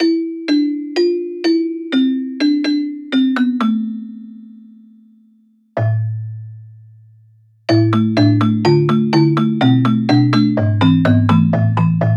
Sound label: celesta; SpectMorph Evaluation #4